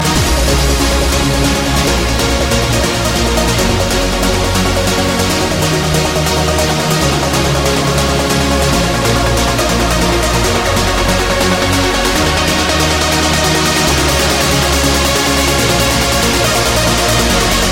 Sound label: Trance Tune